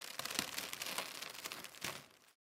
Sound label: rope